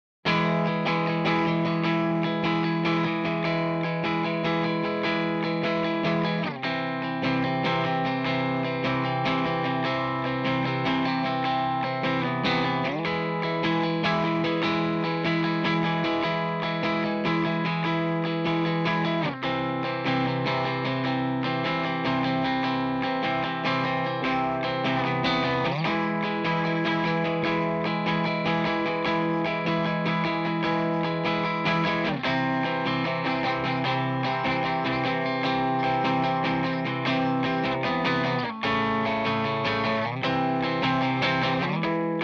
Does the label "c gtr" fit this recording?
Yes